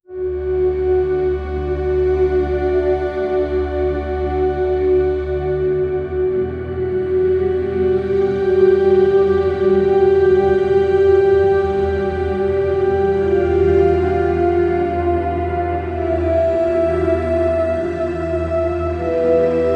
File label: mystical cinematic music